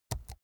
keyboard laptop key press slow soft

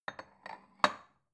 bowl shelve soft

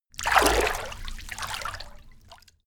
moving

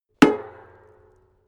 metal impact tank small solid

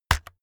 keyboard external key hit fast

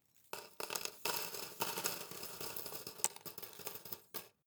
paperclips drop wood table

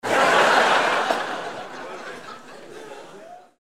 laugh

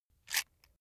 matchbox rattle

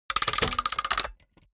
mechanics small rattle

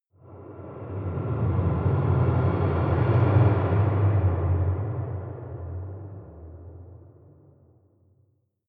air dark fire

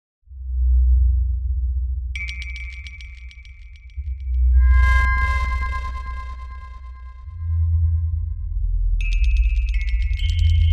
strange sounds drone

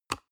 paper box small open hard

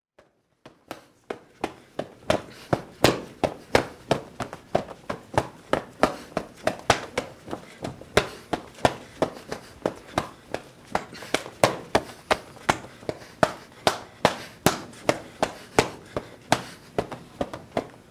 karate kicks to body

